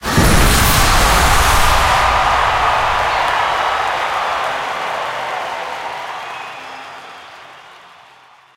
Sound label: large Win; netent